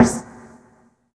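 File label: 98 MOD SNR-R, snare; East West - Phat & Phunky CD2; 90's Sample CDs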